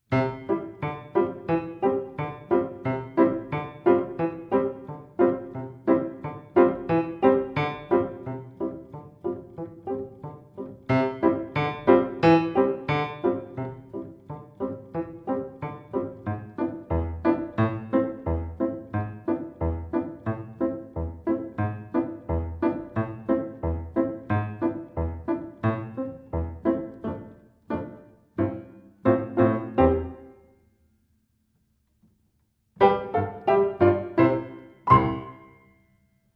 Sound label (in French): le papillon instrumental